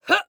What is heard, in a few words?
Grunt Jump; paladins; Flak